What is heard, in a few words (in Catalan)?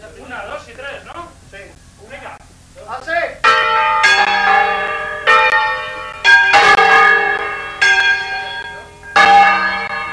campana